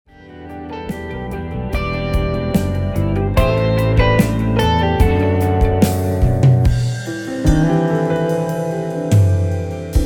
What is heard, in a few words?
short fretless solo m Fade